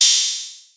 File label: Open Hihat